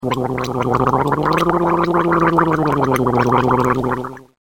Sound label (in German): GURGELN